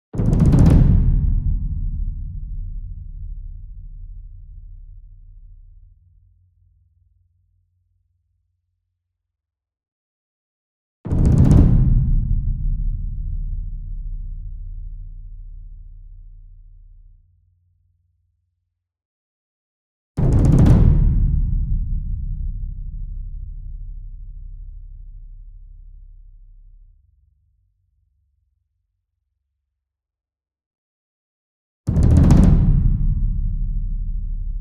ENSEMBLE DRUM Bass Drum Concert And Taiko Roll Near; boom library; Cinematic Strikes Construction Kit